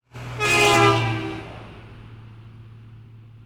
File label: truck pass horn